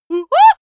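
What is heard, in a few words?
female scared comic, screams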